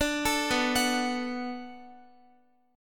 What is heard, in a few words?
B Minor 7th B Guitar, chord; Bm7 arpeggiated